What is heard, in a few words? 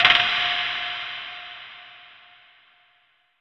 Impacts